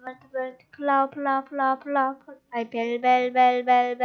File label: bell